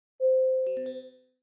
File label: payday